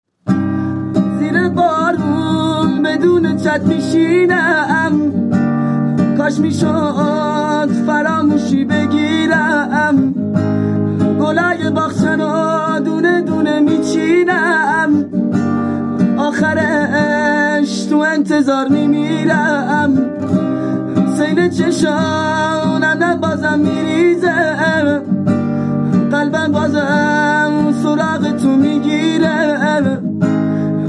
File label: Guitar